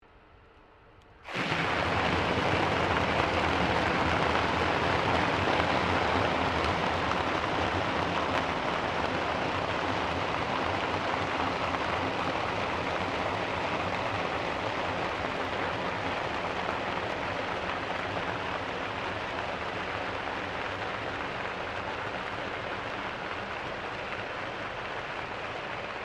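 Boat Custom Restaurant Boat Large Engine Manoeuvre Submerged; large resteraunt boat; Boats; Vehicles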